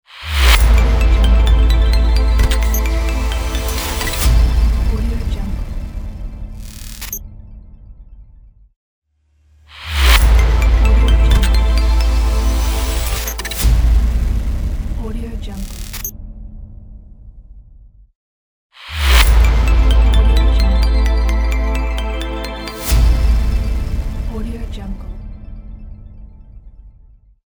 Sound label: technology logo